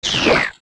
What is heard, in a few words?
Gohma Larva Die